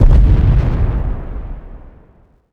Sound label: land hard dirt